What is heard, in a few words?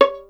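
STR violin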